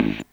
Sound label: Kick Lo Speaker, Stylophone Beatbox